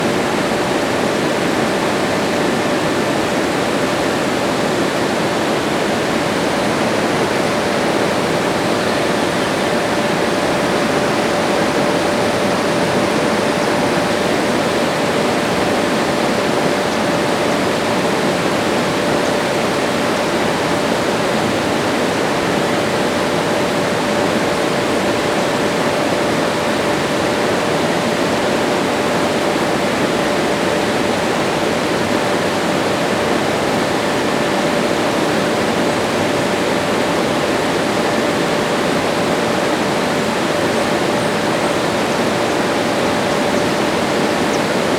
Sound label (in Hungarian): hotel patak